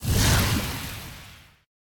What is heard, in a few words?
rocket tail; smite; vulcan